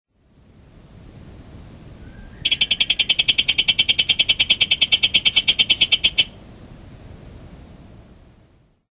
Heterixalus boettgeri

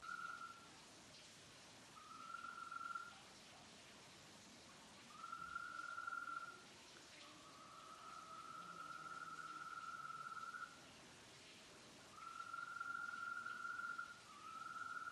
Bufotes oblongus

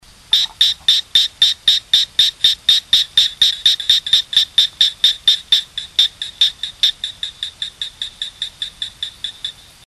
Gephyromantis decaryi

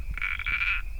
Bokermannohyla nanuzae

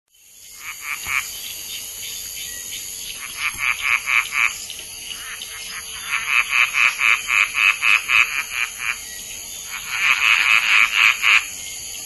Aglyptodactylus madagascariensis

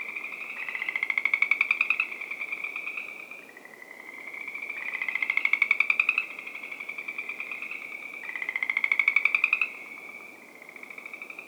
Pseudacris triseriata

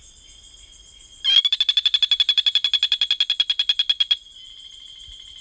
Oreophryne gagneorum

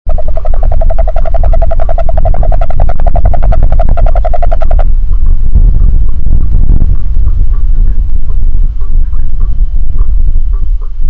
Rhinella icterica

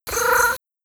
Bokermannohyla circumdata

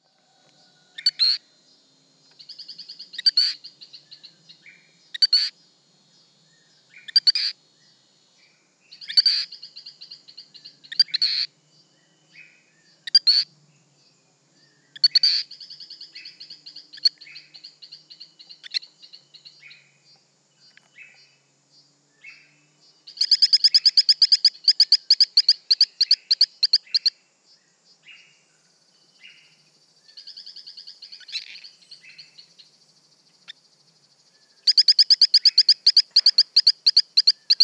Hylodes phyllodes

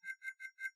Xenopus lenduensis

